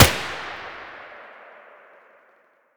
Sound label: mdr muzzle mono distant, weapons